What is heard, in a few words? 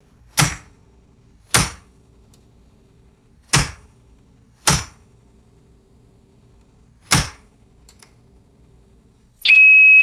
sharp electronic beeps and mechanical key strikes; ui